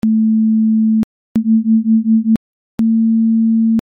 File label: ascending